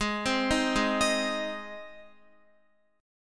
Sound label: Gsharp Major, Guitar; arpeggiated; x,11,10,8,8,10; 4E,4A,5D,5G,5C,6F